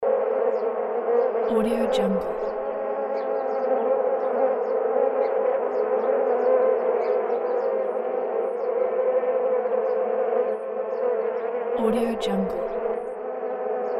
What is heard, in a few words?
flies loop